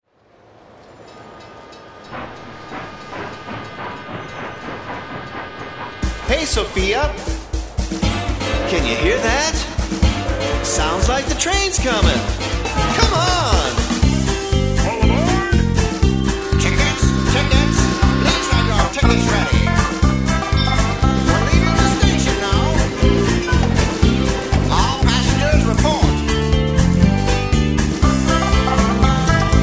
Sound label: TRAIN SONG